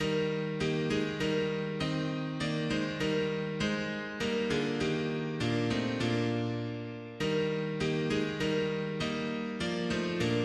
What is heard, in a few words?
mono piano; hymns; Himnario grande